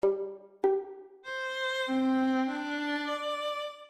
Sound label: crwth; AJ Hipkins